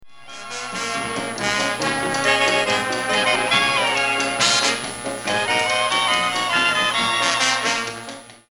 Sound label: instrumental